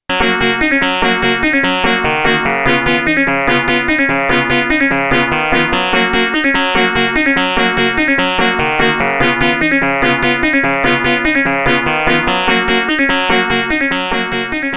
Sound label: tono arcade flohwalzer; City Connection